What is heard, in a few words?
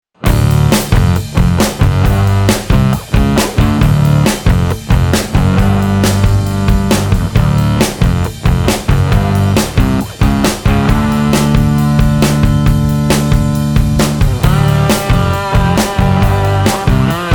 FUZZ; FIREBIRD Mix